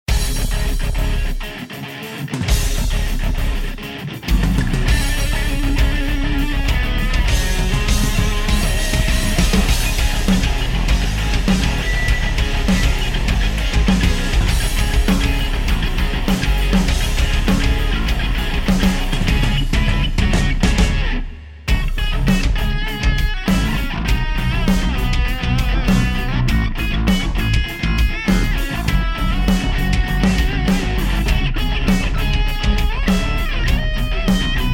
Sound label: battle music